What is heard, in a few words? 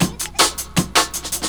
break